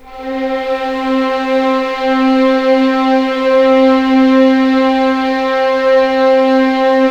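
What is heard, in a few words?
C4 legato mf violins, right; East West; The Ultimate String Collection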